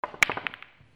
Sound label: BILLIARD